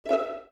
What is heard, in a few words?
dial tone orchestra; Oppo; Neo 5; ui